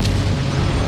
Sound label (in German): RAKETE; Best Service; Advanced Mega Effects